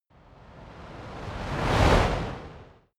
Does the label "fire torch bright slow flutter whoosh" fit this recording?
Yes